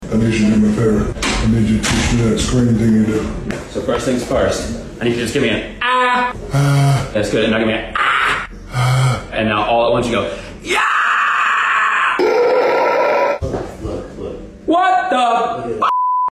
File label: METAL SCREAM; SHAQ LEARNS